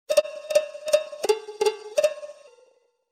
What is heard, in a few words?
Intro Cowbell